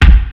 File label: Ensoniq EPS Kick